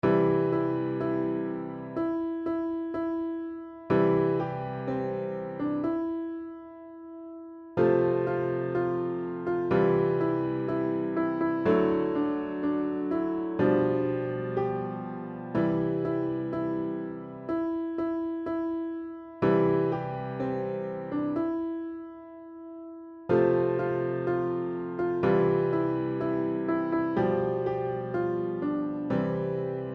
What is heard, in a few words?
Jingle Bells